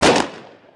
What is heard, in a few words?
hit new; Soldiers Combat